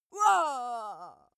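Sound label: female death, screams; schreie; menschen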